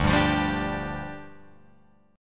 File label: D Minor Double Flat 5th, down, Guitar, Open F; Dmbb5; strummed; JGuitar